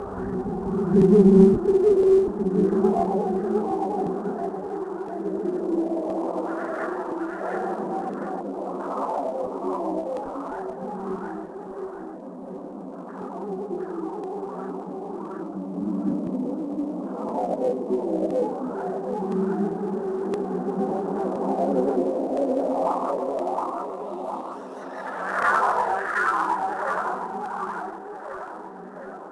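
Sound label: ambience; Quantum Redshift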